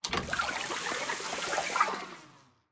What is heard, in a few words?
Fusebox Switch; Puzzle